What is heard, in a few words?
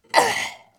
Man Hit